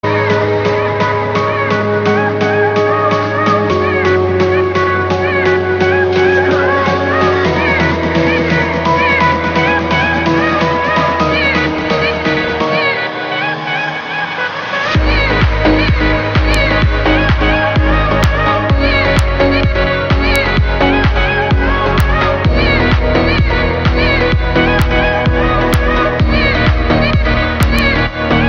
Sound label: Huawei P30